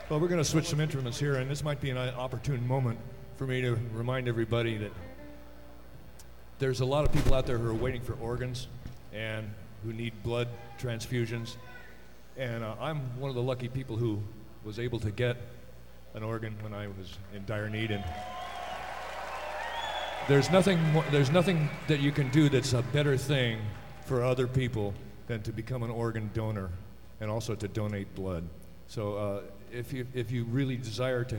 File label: Organ Donor Rap